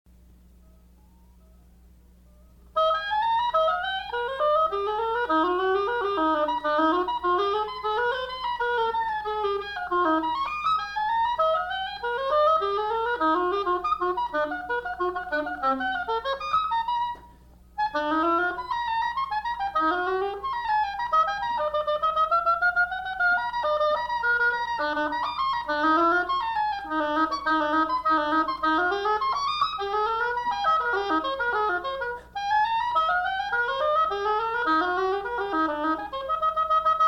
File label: oboe